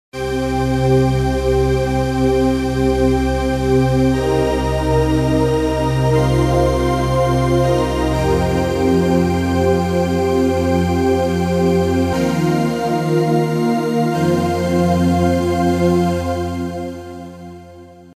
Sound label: Embracer Trinity Church